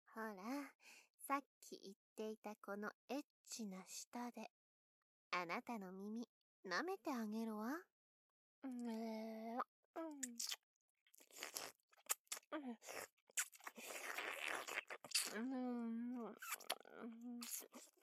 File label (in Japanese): 耳舐め, ASMR